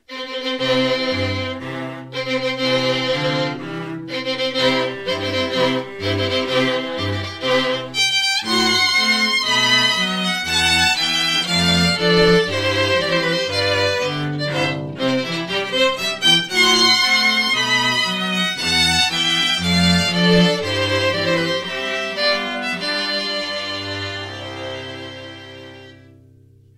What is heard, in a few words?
Wedding March